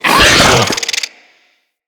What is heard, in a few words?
Infested Ancient Pain; Enemies